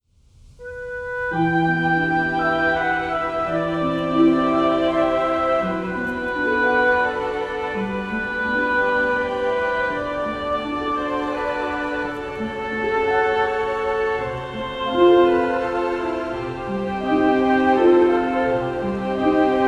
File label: Sadness and Despair